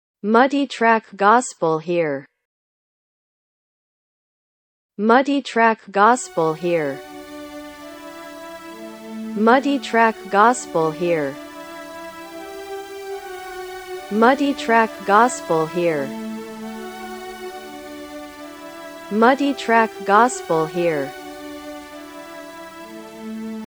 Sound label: Keys Synth; gospel